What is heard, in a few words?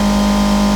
engine, truck; vehicles; godot demo projects; truck town